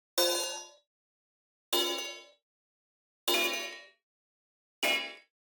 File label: Digital Click Negative